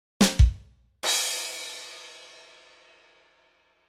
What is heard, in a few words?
ba dum tis